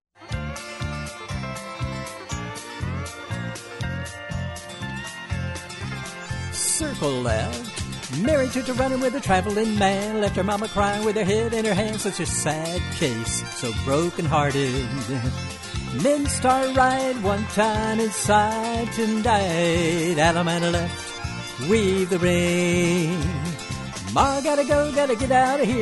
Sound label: vocal; square dance music